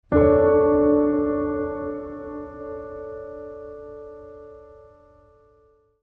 Wonder Chord

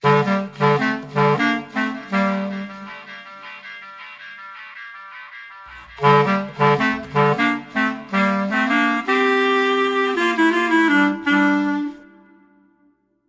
Clarinet Sting

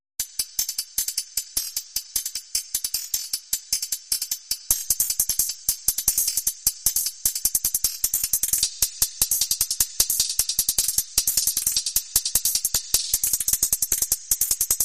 Hihat Hatrick, Underscore

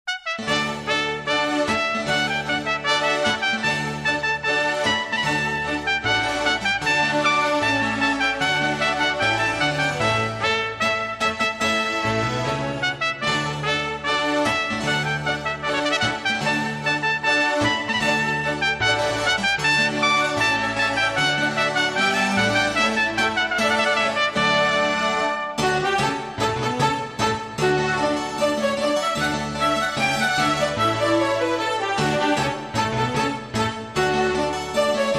Rondeau From Sinfonie De Fanfares

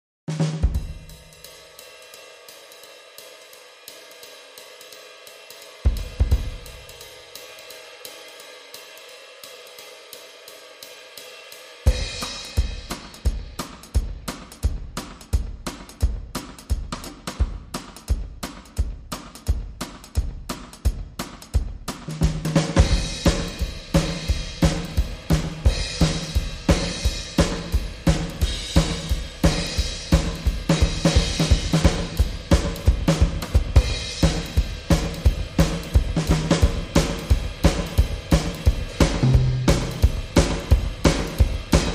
Drums Clean

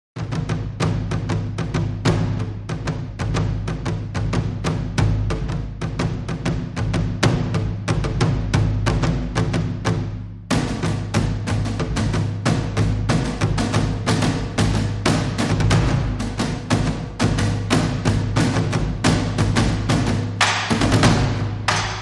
Lighter Percussion Only

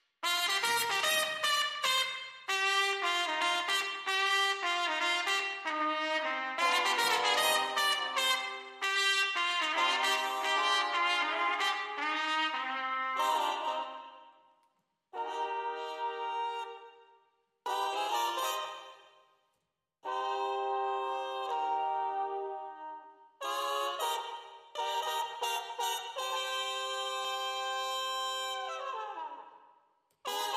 Trumpets

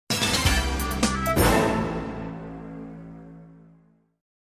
News Nonstop, Sting

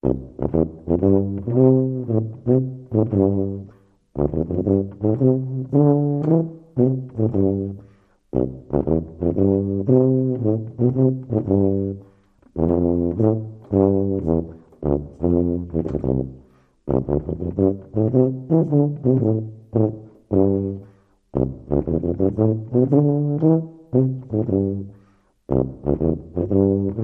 Tuba Shuffle

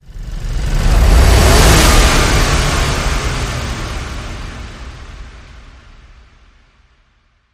Engine Fever Transition

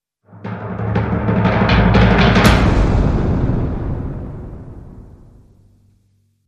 Dark Side, Roll Hit, Sting

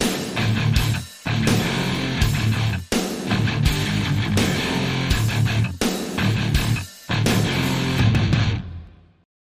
Metal Attack

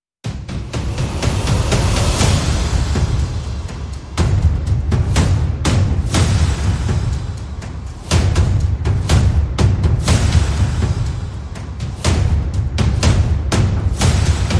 Perc And FX Only

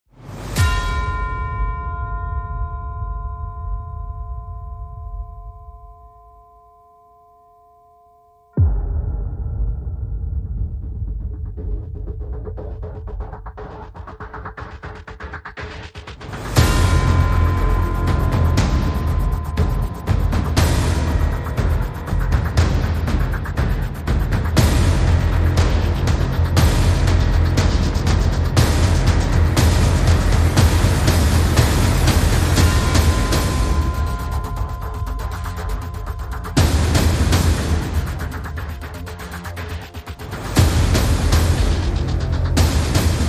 No Strings

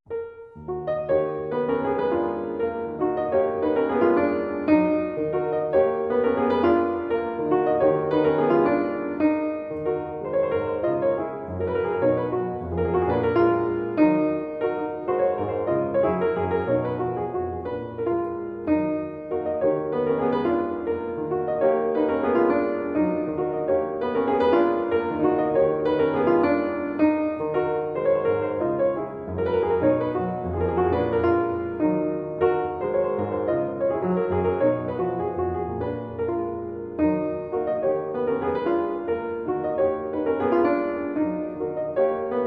Mazurka in E flat minor; Op 6 No 4

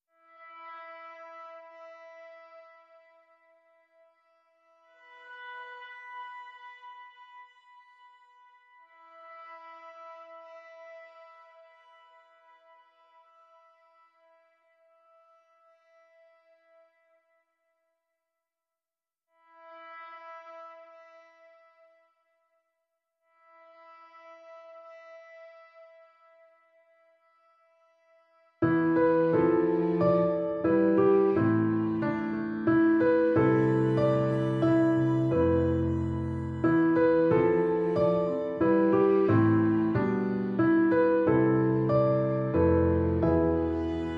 Piano

